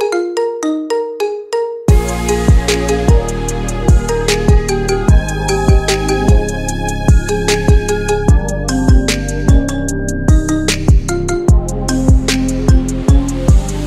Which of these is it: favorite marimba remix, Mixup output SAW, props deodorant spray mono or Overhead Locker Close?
favorite marimba remix